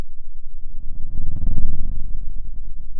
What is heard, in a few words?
pop, Sound of a dinosaur